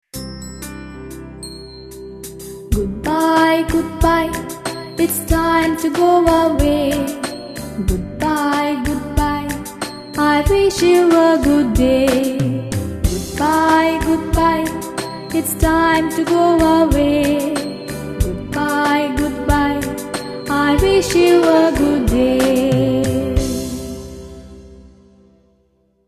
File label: goodbye song